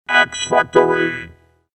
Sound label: sonovox; x facory; Kermis X Factory tunes